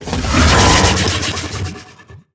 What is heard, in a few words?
idle out, barnacle; Half Life Alyx